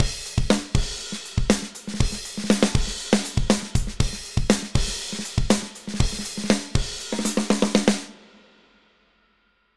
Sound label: addictive drums; sonar platinum; addictive drums 2